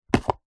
foley plastic lunch food pot remove from inside another; zapsplat